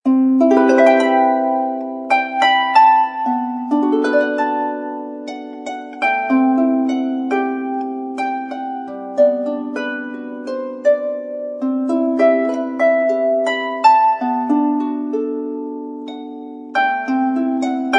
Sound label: romantic